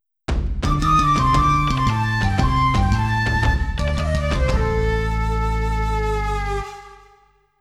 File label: Defeat Jingle; tokimeki memorial 2